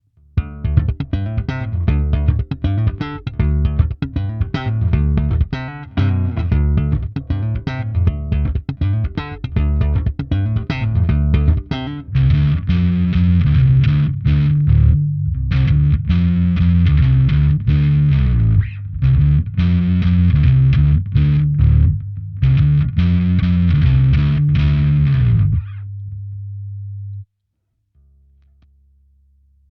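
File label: Clean dirty Cabsim, guitar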